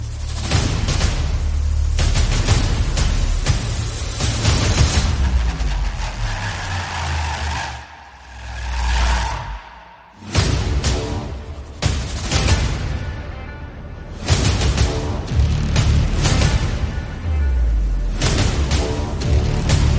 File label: electronic beat; Music